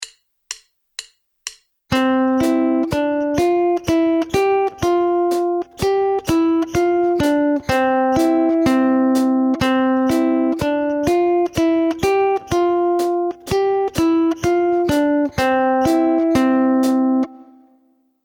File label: skipping along